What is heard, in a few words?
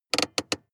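sports car interior handbrake pull medium long; verkehr-transport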